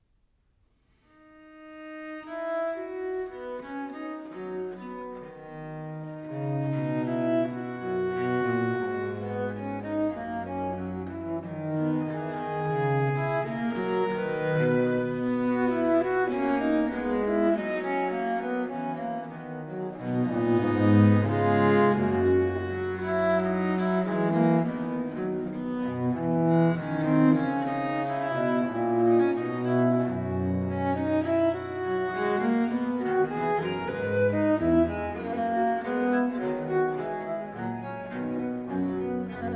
duo for two bass viols, in c major minor

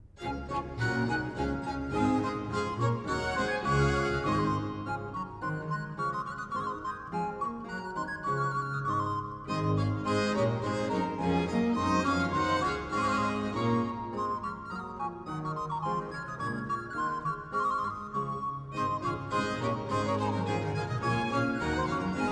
noels pour les instruments; ou s'en vont ces gais berge; Boston Camerata

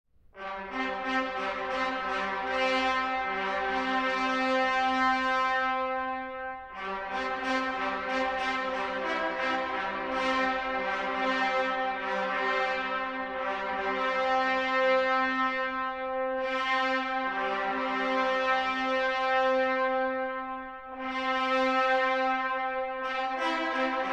6 trumpets; Tocada ... con vitoria

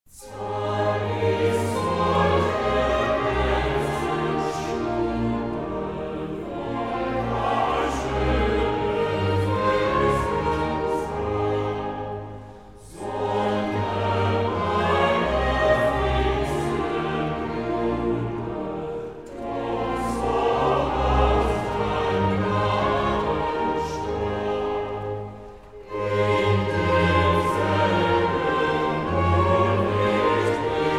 cantata chorale; bach christmas oratorio

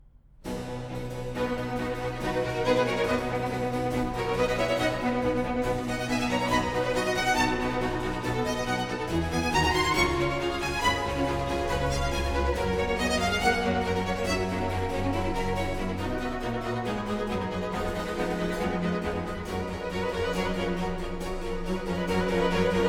air why do the nations

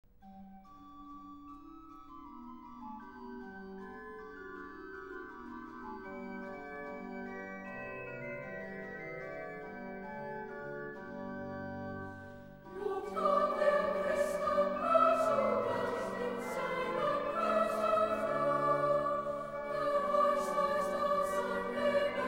hymn; Shulz Christmas Vespers; lobt gott, ihr christen all zugleich

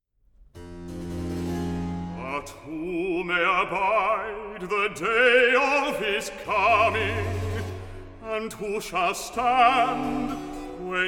recit; but who may abide

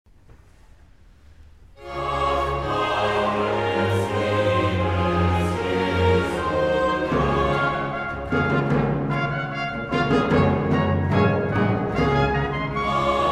Cantata I Chorale; Bach Christmas Oratorio

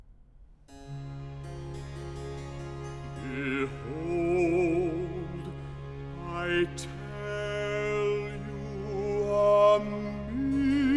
recit. accomp; behold i tell you a mystery; Handel Messiah

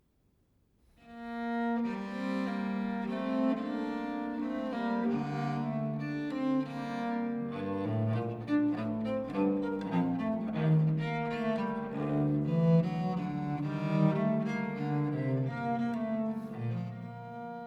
aire for lyra viol

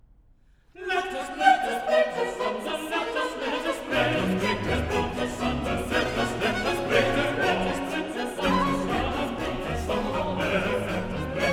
chorus let us break

